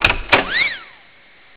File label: Contact Coming Online; Siemens; C75